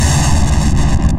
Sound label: Crushed